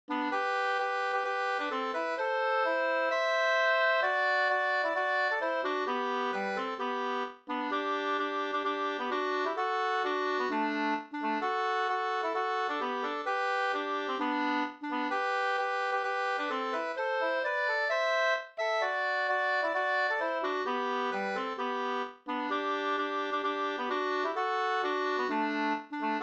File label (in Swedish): folkdans Jagarmarsch Gdur